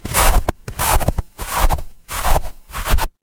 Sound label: footsteps snow